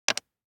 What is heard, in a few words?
DICE on Plastic, Drop, Standard, 2 Two Dice; Samples; ArticulatedSounds; DICE Sfx Party (Extended)